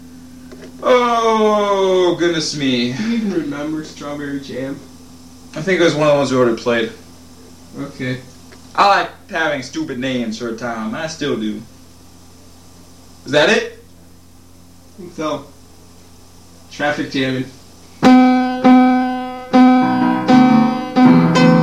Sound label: traffic jammin